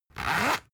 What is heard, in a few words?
zipper close